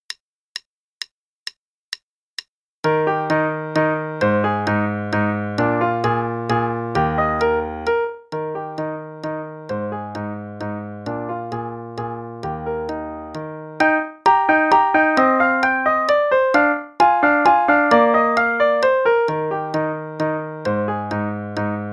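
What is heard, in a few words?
Play-a-long, Dance, quarter note=132; Neefe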